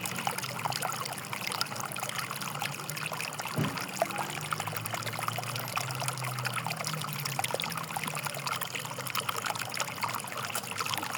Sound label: Water sound effect